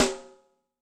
RIM hip op, Yamaha DTXpress-II snare

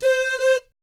DOO DOOT, Vocal Planet Jazz; ILIO; Jazz & FX; JAZZCOMBO

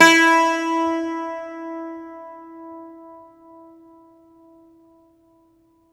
IRISH BAZOUKI, Plucked Strings; E-MU Producer Series Vol. 7 – Old World Instruments

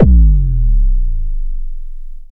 Big Bass Drum; Zero-G; Soundclash Nu Dance Electro; Acidized Wavs; FX Atmospheres

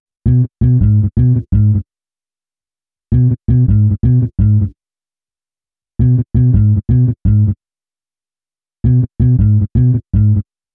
Bass, Hip-Hop

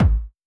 Kick, Drum Hits

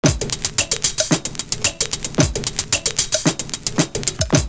PERC, loop; Univers Sons - Kitch 70s

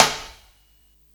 Hihat, Psychedelic Drums

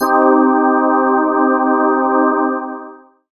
pad, Dance Synths Break Pads

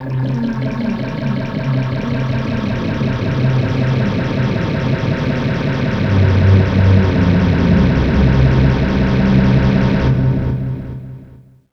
AMBIANT, Special FX Twisted; USB Soundscan; AKAI

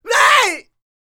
ROCK, MALE HUMAN; ILIO; Vocal Planet

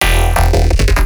Bass Shot; neuro; BIG5AUDIO

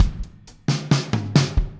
Fill, Full Mix, Drums; Sonic Foundry (Sony Creative Software); Drums from the Big Room The Mixes; Demo Projects

